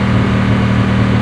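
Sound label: Engines medium loop; SuperBike2001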